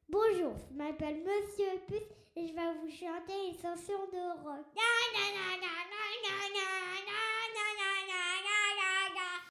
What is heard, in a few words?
lalala